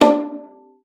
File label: D4, VIOLA FINGER; Xsample VOL-5